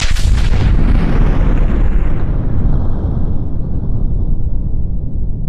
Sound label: explosion; juegosdidacticos; jug-bomba estrategica